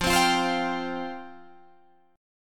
F Suspended 2nd F Guitar Drop D

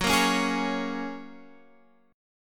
Gb Major Add 9th, down, Guitar, Standard; strummed; JGuitar

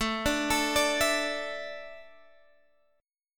A Suspended 4th down Guitar Drop D

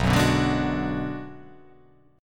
B Minor 6th B

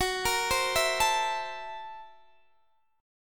F sharp 7th Sharp 9th Flat 5th, Guitar, Open D

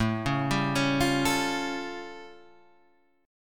A Augmented 7th A; A+7 Chord {5 4 5 6 6 5} arpeggiated

